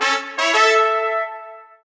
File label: Fan Trumpet; Kirby's Extra Epic Yarn